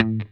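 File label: electric guitar note mute A3 right; Sampleheads; Whole Lotta Country 1 & 2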